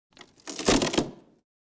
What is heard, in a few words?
cracks wood crate; fights bodyfalls; Asian Show Sound Effects